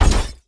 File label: metal door hit